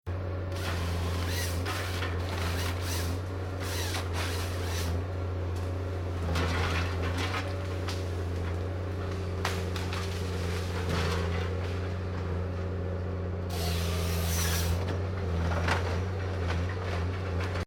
Industrial Forestry Tree Harvester Cutting Tree Down Engine Chainsaw; Construction Vehicle